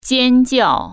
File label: scream